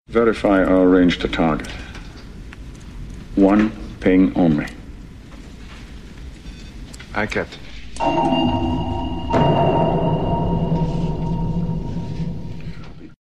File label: Ping; One Ping Only